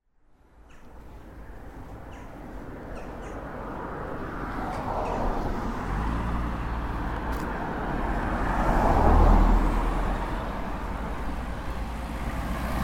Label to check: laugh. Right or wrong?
Wrong